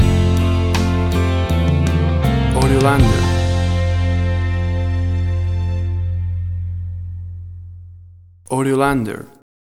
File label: Country Ballad Romance Music Theme Stinger